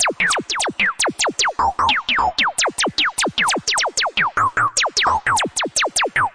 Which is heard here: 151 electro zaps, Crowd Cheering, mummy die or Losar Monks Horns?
151 electro zaps